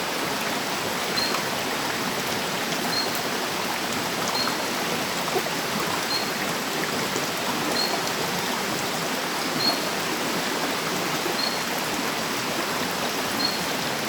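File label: Anomaloglossus blanci, frog; guyane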